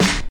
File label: old school boomy snare sample f sharp key, acoustic snare sample tuned to the F# note